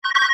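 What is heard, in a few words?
Phone Ring Reverse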